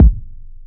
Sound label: KICK; drums